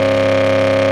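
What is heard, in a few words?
engine exhale, advanced engine sounds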